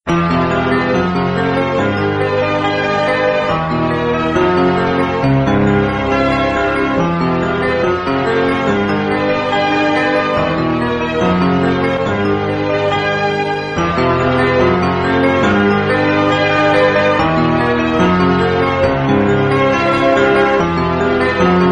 Instrumental

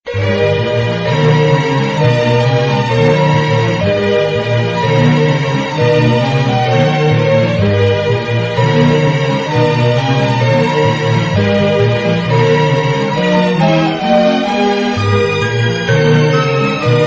Instrumental Fugue

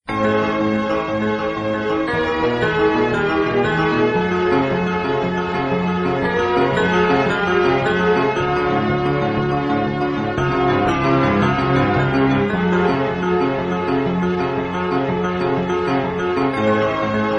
Instrumental Restless

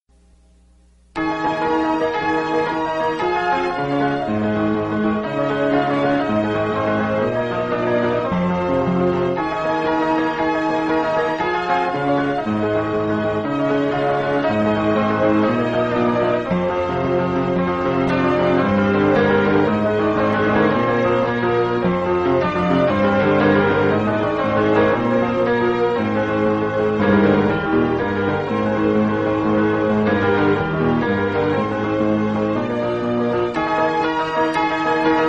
Instrumental Reverie

